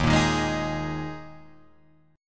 D sharp Suspended 4th, Guitar, Drop D; chord shape

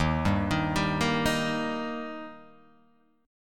Eb Major Eb; arpeggiated; JGuitar

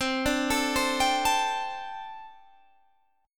C 6th Suspended 2nd C

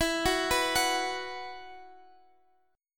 E Suspended 2nd, Guitar Drop D; x,x,14,11,12,14

